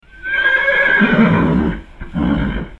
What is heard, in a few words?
horse